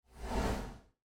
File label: Swoosh Transition